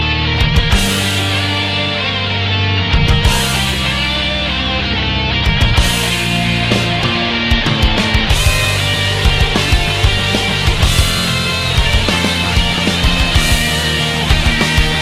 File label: Rock Version